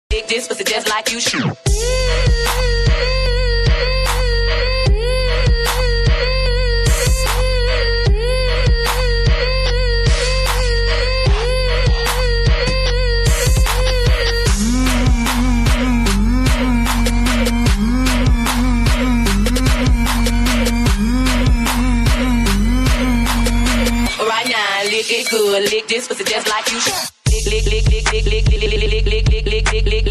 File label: Mobile Ringtone